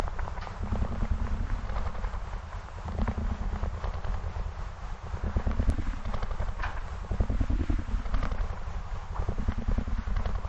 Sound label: Invunerable Hold